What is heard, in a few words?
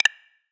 restart